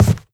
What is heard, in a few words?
scuff, footsteps cardboard